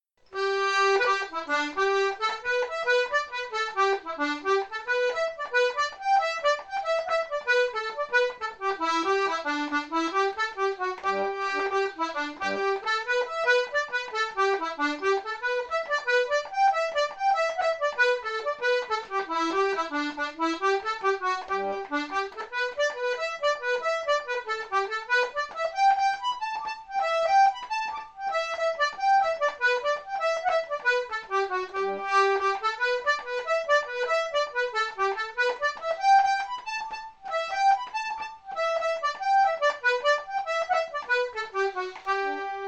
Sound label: Jig; Fanad Lighthouse Jig